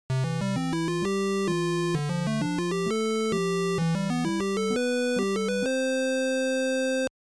Arcade game 16 bit or 8 bit level complete win success; Success Sound Effects; Video Game Success